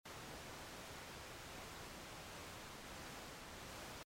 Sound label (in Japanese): kaze